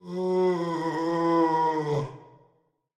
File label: Voice Hurt; BatIM; Searchers